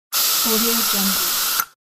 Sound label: bug repellent spray slow; inspiringideas